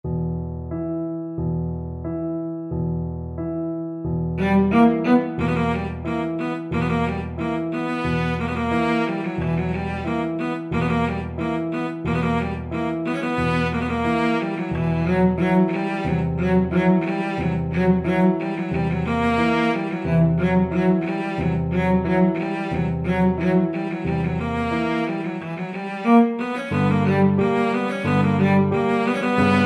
bartok romanian folkdance, cello

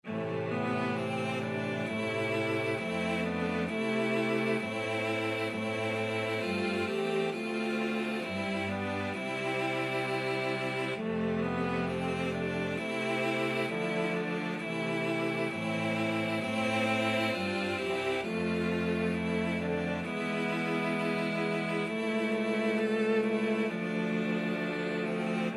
cello quartet

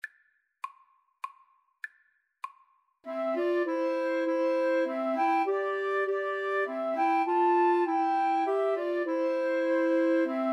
come thou fount, 2 Flutes Clarinet

